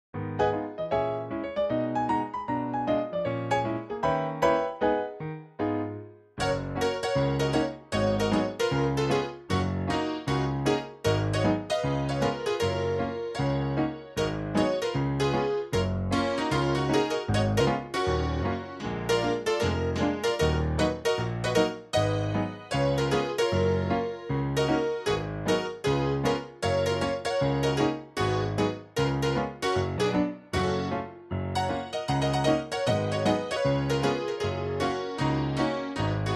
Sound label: Honky Tonk Stomp